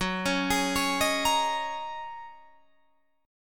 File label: Gb 6th Add 9th, Guitar Drop D; chordshape; down